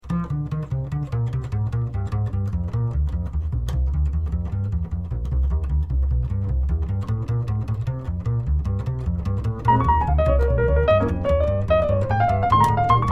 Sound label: minus drums